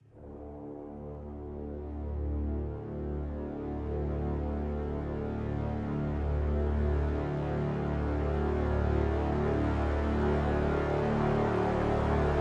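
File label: Crescendo Brass Swell Lo (D)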